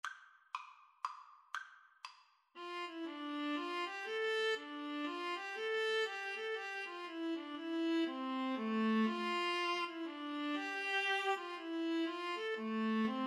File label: Viola Duet